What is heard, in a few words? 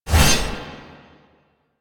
Blade Swipe; Weapons; Melee; Warframe; Dagath